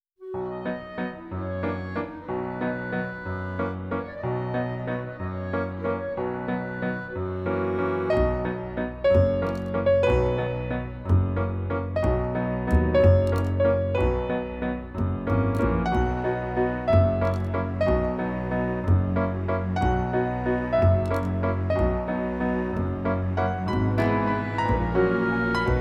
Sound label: Instrumental